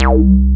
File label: TB 404, Synth Bass